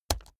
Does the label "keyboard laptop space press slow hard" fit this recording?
Yes